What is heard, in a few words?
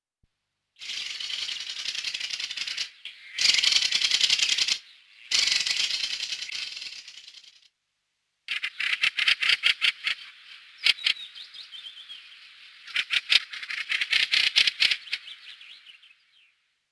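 Mistle Thrush call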